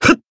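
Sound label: Voice attack, Japanese; zeviro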